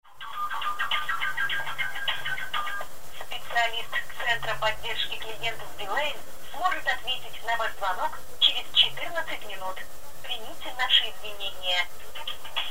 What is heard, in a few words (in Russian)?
ojydanie otveta operatora; Beeline